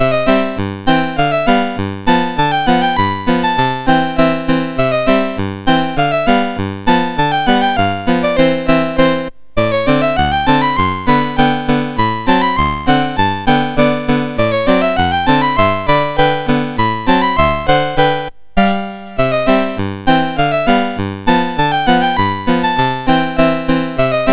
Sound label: Polka, MOS6581; Unita Polka